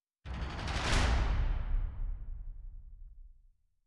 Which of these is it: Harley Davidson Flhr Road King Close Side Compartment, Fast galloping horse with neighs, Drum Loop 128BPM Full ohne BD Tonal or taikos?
taikos